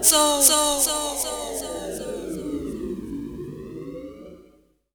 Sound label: Soul Vox; Jungle; Vocals; Blu Mar Ten - Jungle Jungle - 1989 to 1999 Samplepack